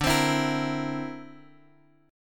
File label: D 7th Suspended 2nd Sharp 5th, Guitar Drop D